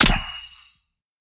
uav laser

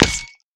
p atk harpbow

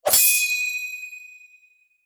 sword tsing

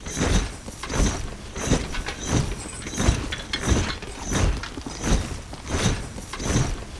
army walking

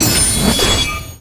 katana

